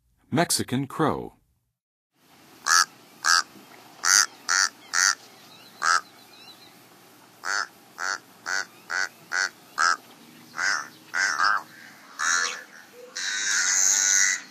Mexican Crow, Songs of Birds; North America; Western USA; Western USA Songbirds

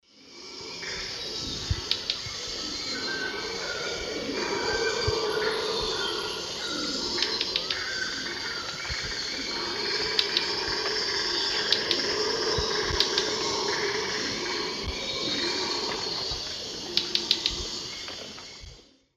Chloroceryle inda, alarm calls; S. America; Eastern Lowlands

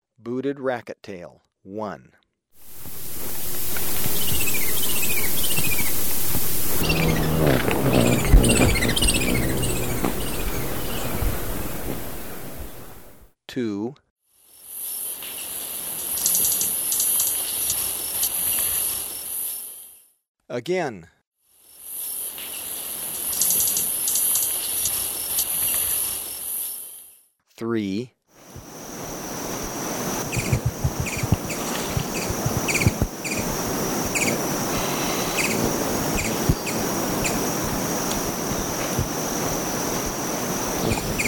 Booted Racket-Tail; S. America

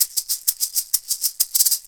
Perc, Percussion Latin

